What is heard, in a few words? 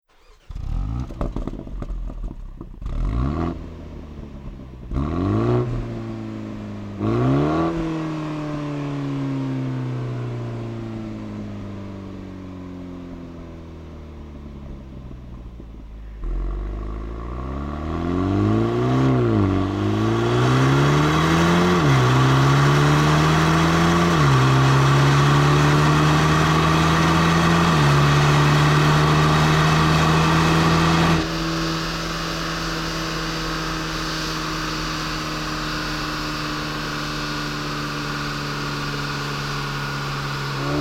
BMW M235ix Remus